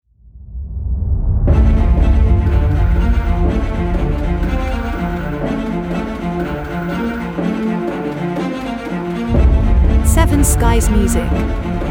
Hope Cinematic Trailer

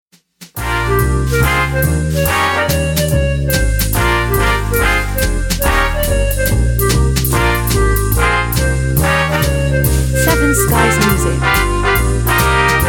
Swing

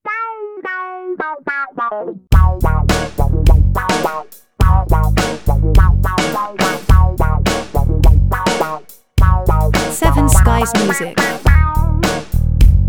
Funny Funk

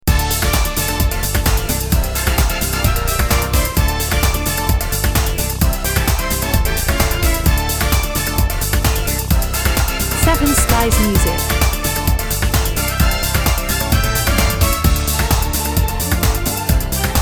Modern Latino

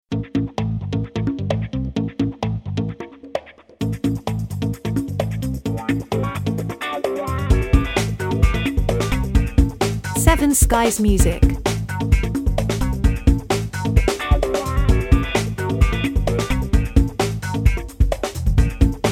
Hip Funk Club

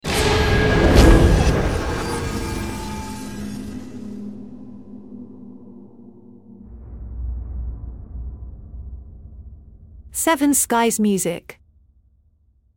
Scary sting

